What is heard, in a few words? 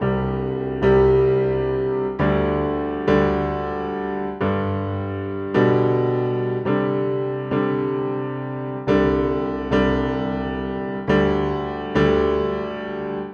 Country Piano, Chorus 3; Digidesign; Pro Tools Essential Loops